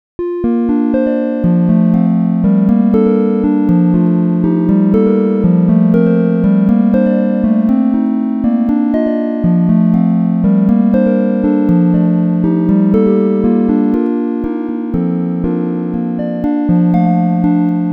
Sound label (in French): harpe